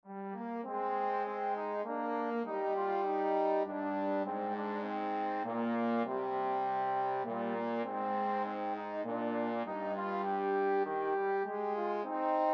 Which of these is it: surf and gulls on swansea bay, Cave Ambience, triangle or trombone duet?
trombone duet